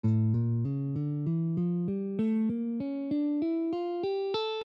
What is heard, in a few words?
A Spanish, guitar